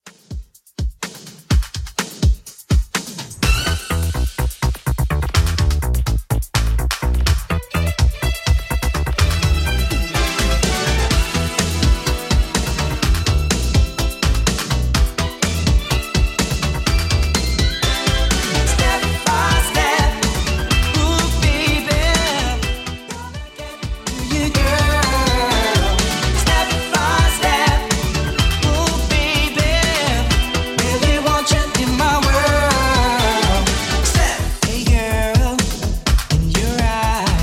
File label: 90s Redrum 125bpm